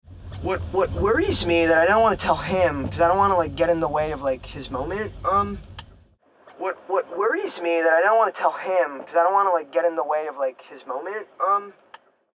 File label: Radio Effect